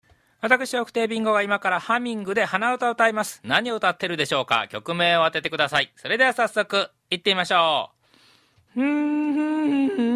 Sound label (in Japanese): hanauta